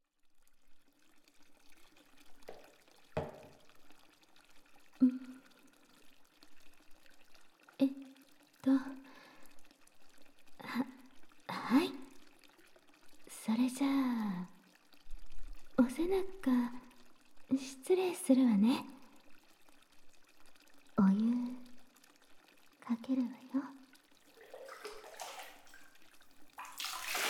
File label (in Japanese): あわあわ背中洗いマッサージ, ASMR; 一人旅中布団で寝ていたら隣の部屋のキス魔な人妻さんが乱入!旦那さんに間違われてエッチなこと沢山されるお話